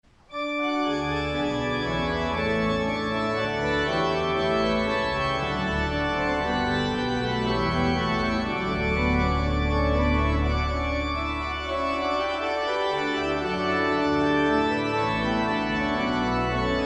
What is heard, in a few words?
Organ, Chorale Prelude; Bach, J S; From Heaven Above To Earth I Come; St. John's Cathedral, Jacksonville Florida